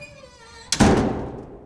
door; Mafie 1

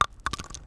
sand; Mafie 1

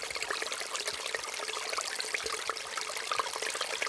sewer; Mafie 1

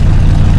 arrow; Mafie 1

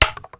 wood; Mafie 1

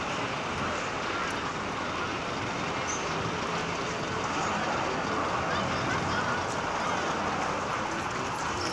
people; Mafie 1

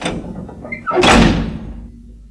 wood; Mafie 1

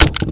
wood; Mafie 1

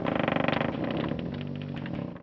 rack; Mafie 1

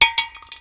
metal; Mafie 1

